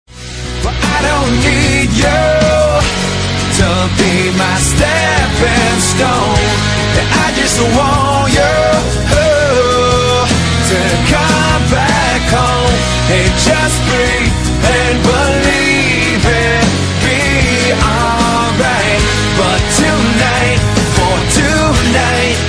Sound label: acoustic